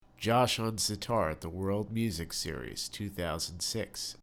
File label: Sitar